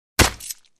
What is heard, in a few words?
slash